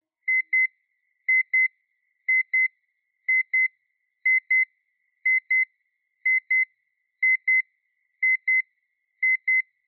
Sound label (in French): bip bip, reveil enfant robot metal; bleu; bayard